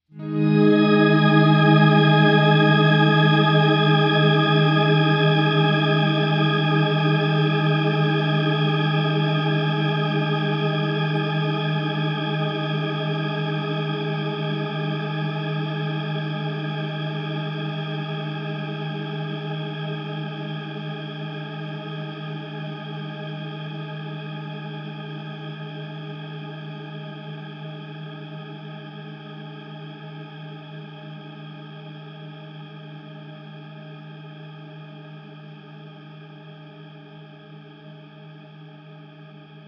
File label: shimmer swell; Eterna Gold; modified reverb algorithm; max decay